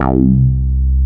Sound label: BASS, Analogon